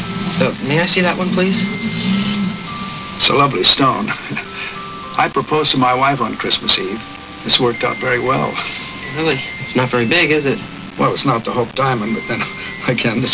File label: ring